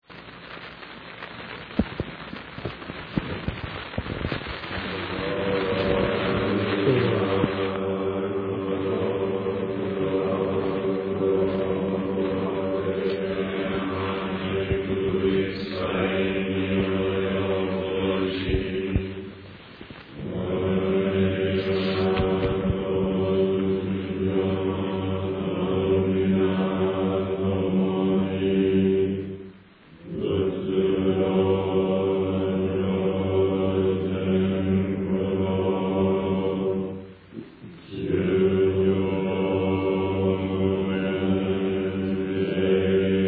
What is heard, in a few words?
end chant